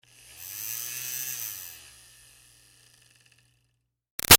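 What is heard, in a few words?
Fan Small Handheld Medium Far Run Short; Electronics; BigRoomSound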